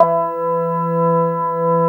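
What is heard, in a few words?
CX3 organ E3 L, Keyboards Old School; USB Soundscan vol.09 - Keyboards Old School; AKAI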